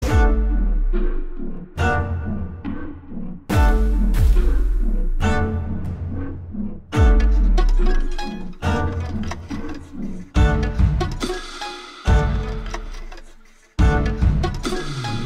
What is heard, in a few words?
Trailer Ringtone; Keerthy Suresh; Penguin